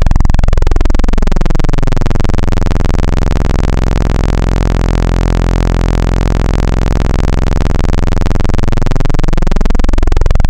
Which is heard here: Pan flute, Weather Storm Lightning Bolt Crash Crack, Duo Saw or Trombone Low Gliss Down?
Duo Saw